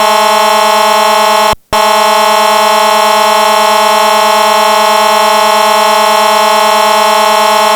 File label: HORN HORN, siren